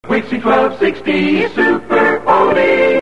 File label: Super Oldie Jingle